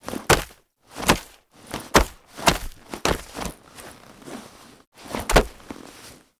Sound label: Grabs Helmet; Cinematics; Teshin; Fighter; Warframe; TheNewWar; Throne Room